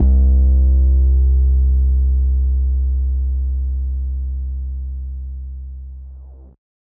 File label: 808s